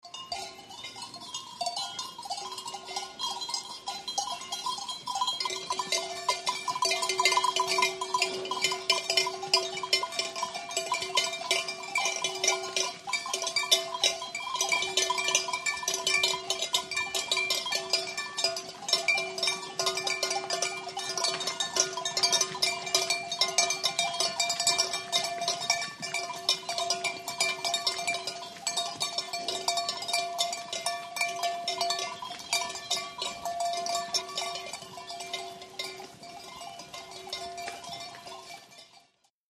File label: Masai Cattle Bells Ta; Masai cattle bells ( Tanzania )